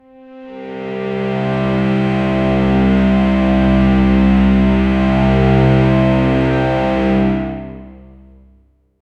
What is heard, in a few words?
string ensemble one note